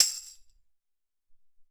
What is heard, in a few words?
Mute Tamb